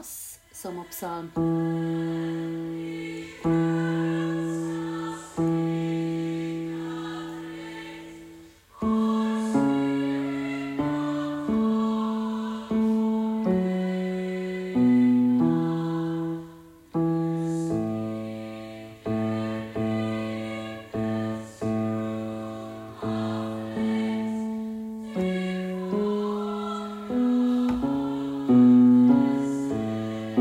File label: Sommarpsalm Bass